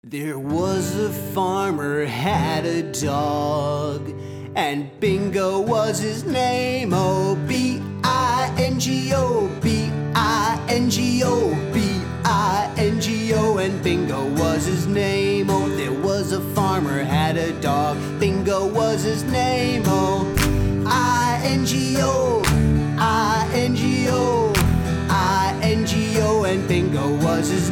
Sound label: Bingo Grin Happy Goofy Style